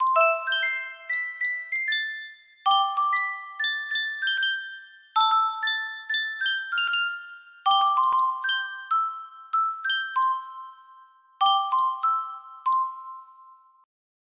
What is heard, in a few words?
sankyo custom tune music box, music box melody